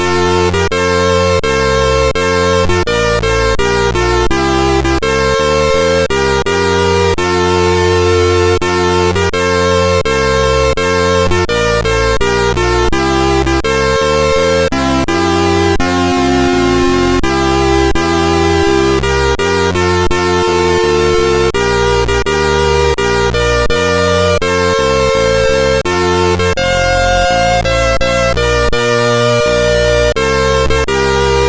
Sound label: Polish Anthem, MOS6581R2; Wijnhoven Joachim